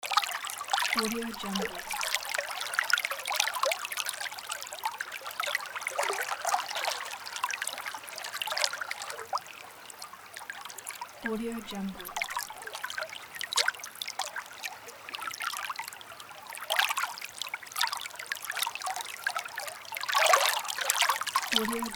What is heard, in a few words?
river loop